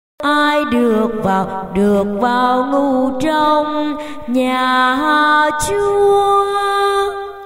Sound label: alto basso